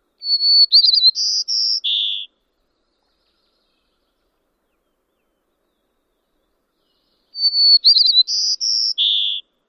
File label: songs